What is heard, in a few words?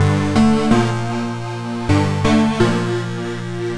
Progresseq, Underground UK House & Electro, Synths; Sonic Foundry (Sony Creative Software)